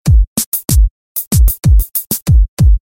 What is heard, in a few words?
Hip Hop Or Rap Beat